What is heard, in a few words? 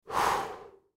Human Blow Out Candle Breathe; Breathing; Blastwave